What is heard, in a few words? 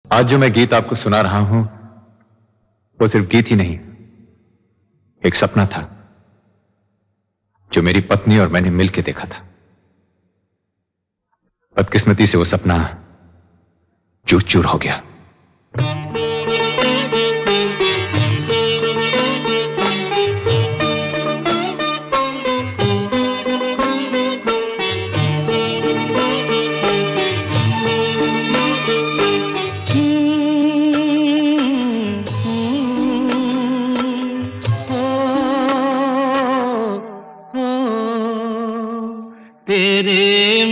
with Dialogues; abhimaan 1973